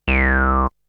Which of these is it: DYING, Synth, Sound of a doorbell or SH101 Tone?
Synth